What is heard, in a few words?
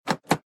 sit; room